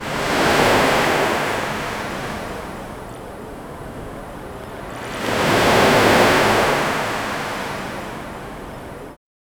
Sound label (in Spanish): barca debil en el mar, ocean